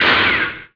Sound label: shot; BESTSHOT; noisybit